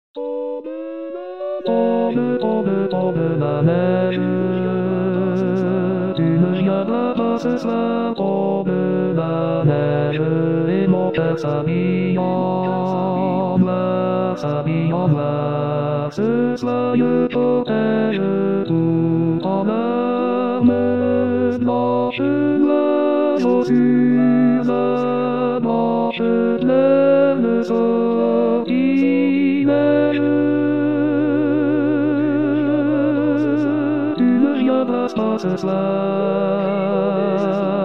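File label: tenor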